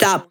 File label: Percussion; Vengeance Samples; VEH2